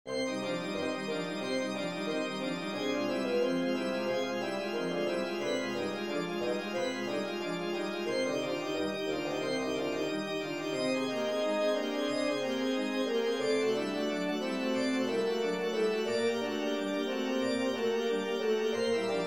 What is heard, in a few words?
pedal study; Johann Sebastian Bach